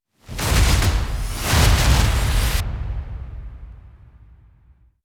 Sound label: Music Ramp Up; Tokyo